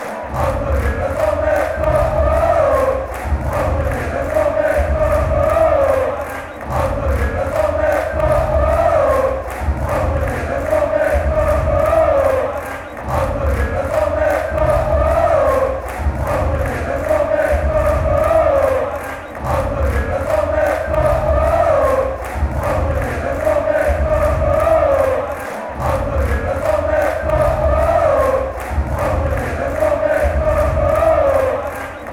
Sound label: oh oohhooo fanchants; genk